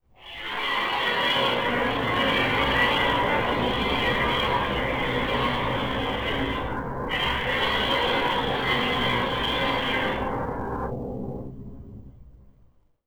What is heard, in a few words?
ambient Voices; Ambient Audio; XDK4627 BIOS and XDK Launcher and Dashboard(for Retail); ogxbox